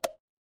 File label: cartoon bonk